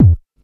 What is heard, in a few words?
boom bass drum sample f key